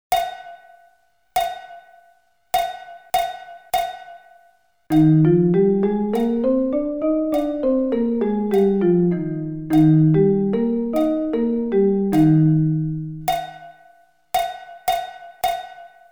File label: Eb scale 1 oct